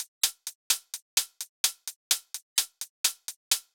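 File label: Drum Loop 128BPM hi-hat; VEC5 Drumloops; VEC5 Multi Loops 128BPM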